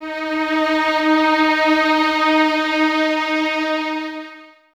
String Violins; String Ensembles; Miroslav Vitous; 11 Violins

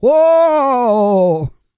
SING, Vocal Planet R&B & Dance; ILIO

